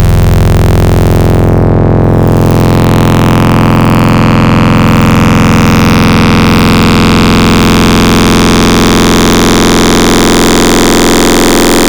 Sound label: Barely A Growl, wavetables; Cymatics; Secrets Wavetables; Serum